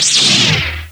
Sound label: LightSaber out; Apache; Slide Sounds